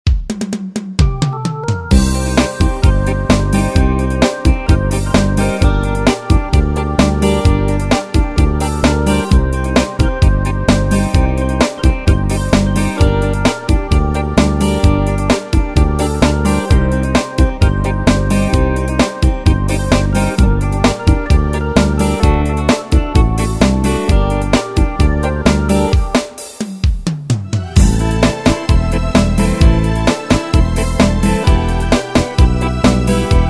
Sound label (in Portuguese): brega variacao a b